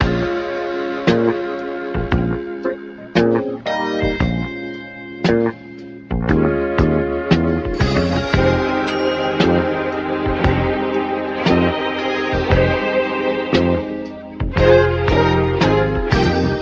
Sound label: full, Loops, Phonky